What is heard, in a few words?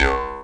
C# JAW, Primitive Instruments; Big Fish Audio - Didgeridoo and Other Primitive Instruments; JAW SIMPLE